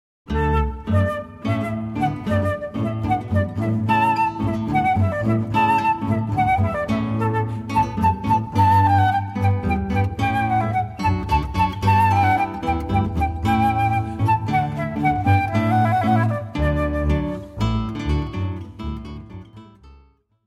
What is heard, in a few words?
Hornpipe, guitar flute bass trio; Alla Danza